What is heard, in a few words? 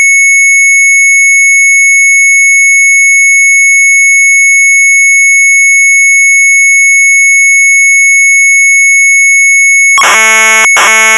Sharp MZ800 Tape